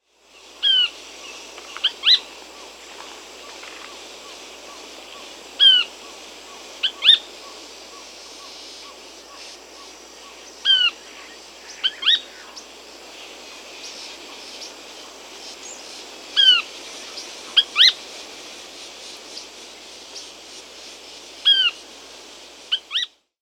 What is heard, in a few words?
Plain-crowned Spinetail (Synallaxis gujanensis), Peru; S. America